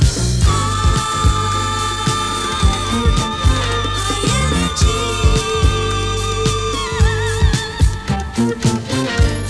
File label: High Energy